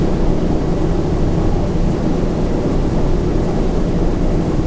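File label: b17 cockpit left, engines; War Thunder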